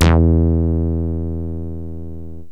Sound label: blip bass, yamaha rm1x synth